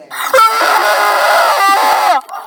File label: scream